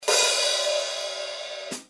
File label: Kerope, cymbal sound